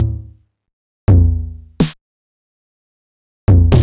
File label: drum loop tech percussion 125, Classic Chicago House; Sample Magic; Drum Loops